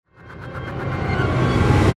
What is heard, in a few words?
Reverse Chopper; Reverse, Whoosh, Metal, Swipe, Horror, Production Element, Imaging Element, Accent, Transition; BlastWave